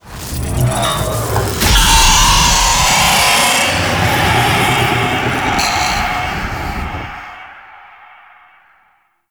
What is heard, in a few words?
Paralyzing Screech Cast; Warframe; The New War; Archon; Boreal; Abilities